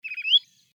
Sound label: Sandpiper Birdsong; Vertu Signature Touch